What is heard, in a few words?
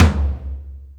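Tom Power Floor, Alesis D4 drum machine; 300 Drum Machines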